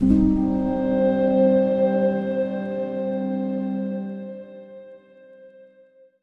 Link Sting

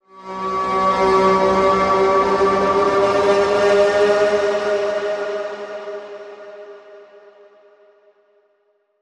Outer Rim Danger Bend

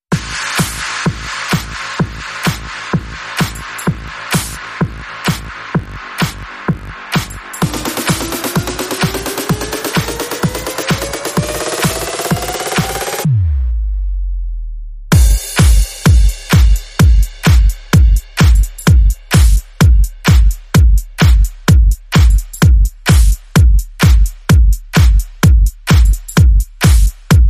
Bass and Beat

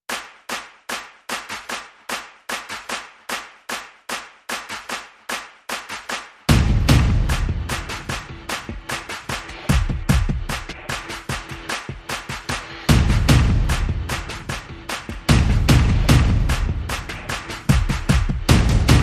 Percussion Only

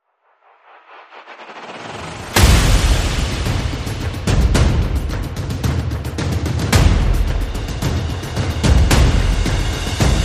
Percussion and Effects

